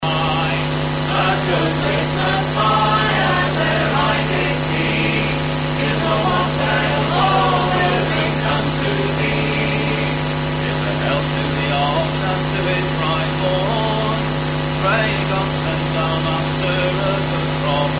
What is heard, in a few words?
noise, Excessive noise on casette tape; Paul Sanders (AlpineSoft)